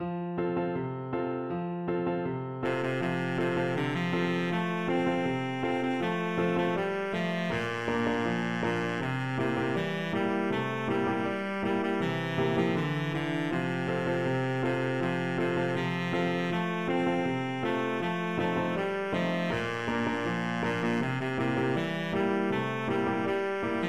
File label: baritone saxophone